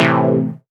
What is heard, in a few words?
Synth Cuts; Vengeance Samples; VES1 Instruments